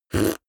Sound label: beatbox effect suck in damped